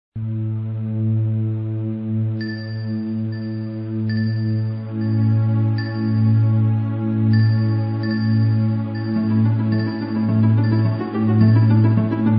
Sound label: Ice Cave Chant; Donkey Kong Country; christmas